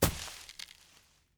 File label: foliage land; teardown; ps5 windows xbox series xs